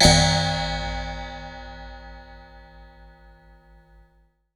Raw HiHat, contact mic; musicradar; contact mic madness

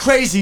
VOX GENERAL; AMG Megabass REMIX! VOL-2

